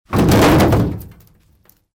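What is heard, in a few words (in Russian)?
упал на капот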